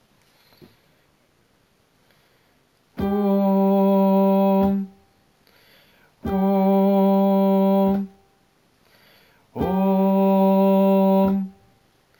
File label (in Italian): tenori, coro; Coro Isola del Giglio